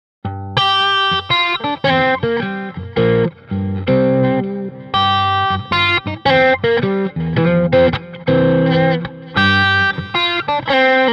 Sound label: Stratocaster